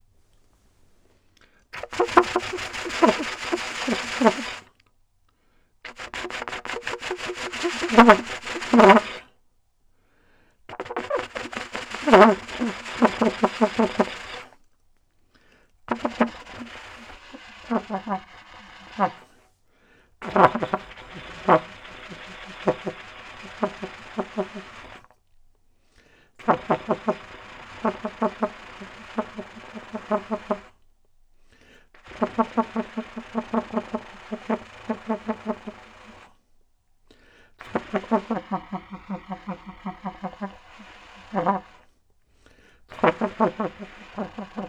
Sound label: bird